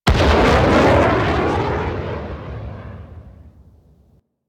Enemy Ship Initial Death Explosion; Rail Jack

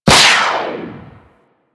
Skate Dash; Warframe; Corpus Modular Crewman; Skate Movement

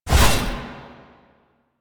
Blade Swipe; Weapons; Melee; Warframe; Dagath

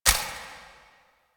Countdown Tick; UI; Undercroft; Warframe; Duviri

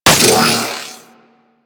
Alt Shot, Weapons; Warframe; Tenno; Miter

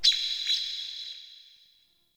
Bird, Ambience, Garden; Eternium Garden

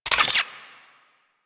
Modular Secondary Clip Mech, Weapons; Warframe; SU Modular Guns

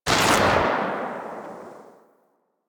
Rifle Shot; Warframe; Weapons; Thanotech

